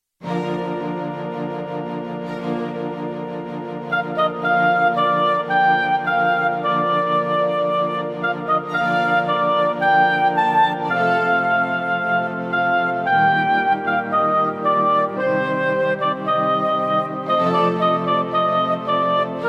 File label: Classical Christmas Carol